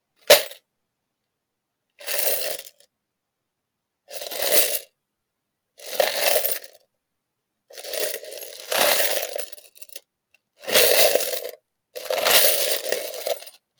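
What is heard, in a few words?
sound of coins in a container; zvuki zvona monet i kopeek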